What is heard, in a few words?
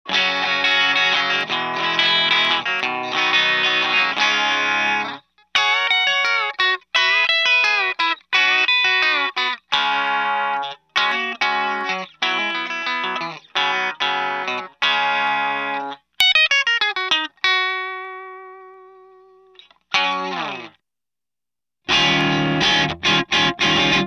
Celestion Classic Lead